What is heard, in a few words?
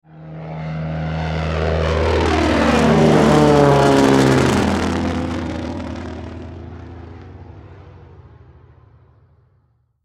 Airplane takeoff